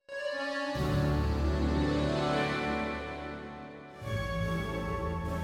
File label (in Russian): Припев аккордеон, Jazz for piano